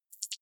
click light, signale

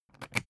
briefcase plastic latch lock key insert; industrie wirtschaft; bueroarbeit

